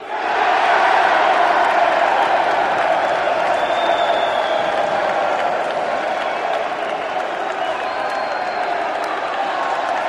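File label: Crowd Cheering Ovation, big arena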